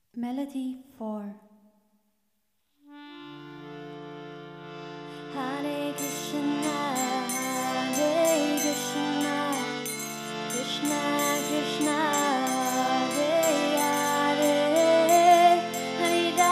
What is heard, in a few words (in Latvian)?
hare krishna melodija, easy harmonium